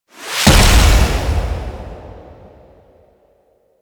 Frost Ice Wave; Warframe; Warframes

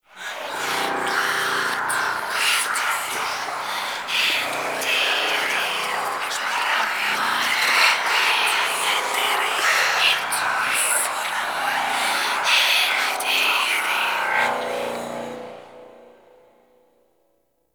Whispers High Pitch; Warframe; Ambience; Entrati

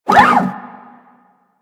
Leg Servo; Warframe; machines; Jackal

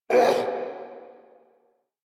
Pain Lich A

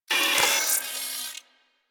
Turret Harness Movement

